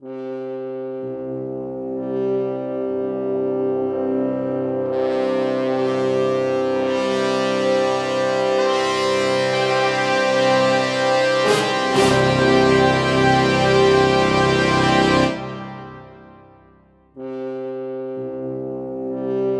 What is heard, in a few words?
Music Moses Brass; 7 Mages